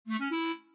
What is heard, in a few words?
clarinet bite